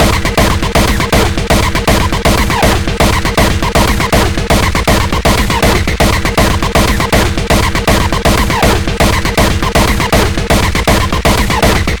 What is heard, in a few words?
Beats Loop; Anger Foot